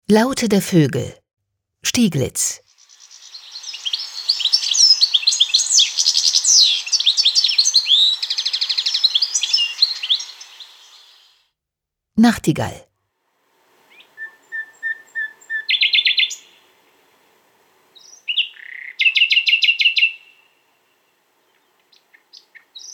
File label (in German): laute voegel; bahnbrechende natur